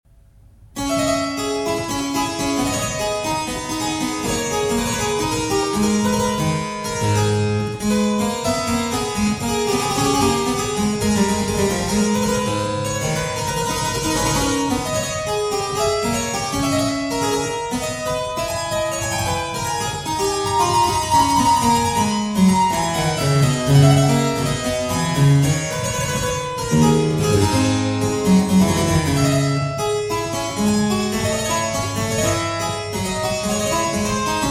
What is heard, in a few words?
Continuo Rameau Rondeau